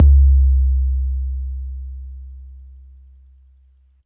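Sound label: bass drum 808 MPC60 Click C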